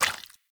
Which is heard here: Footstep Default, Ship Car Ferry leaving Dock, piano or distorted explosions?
Footstep Default